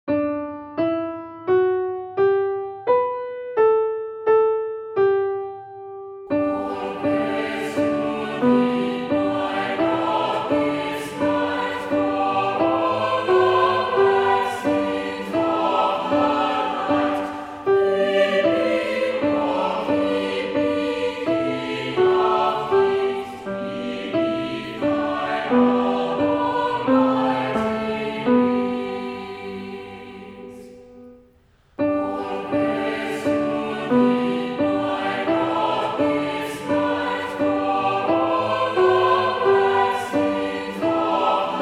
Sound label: All Praise to Thee alto